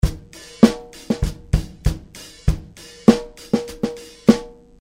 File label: drumfill